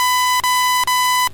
rwr radar tone

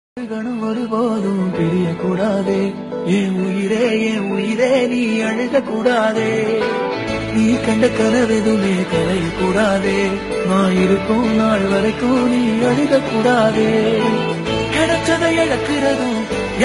tamil title song

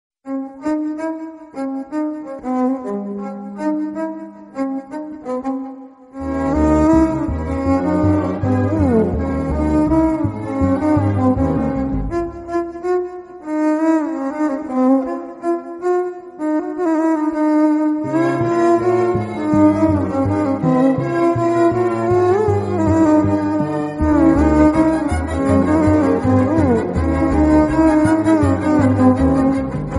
kannada instrumental violin background music

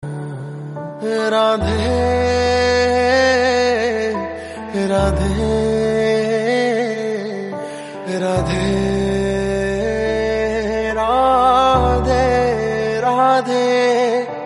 devotional hindi

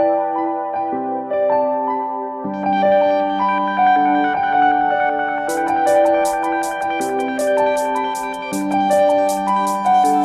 lofi; mahabharat; ost mahabharata

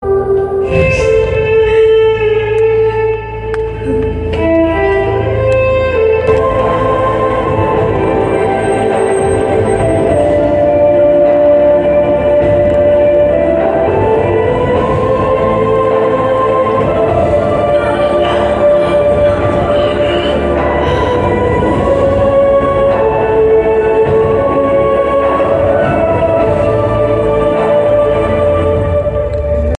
sad medieval music bgm

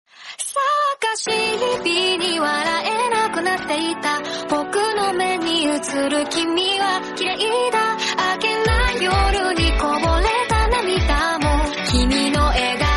japanese remix song

